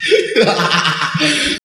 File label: laugh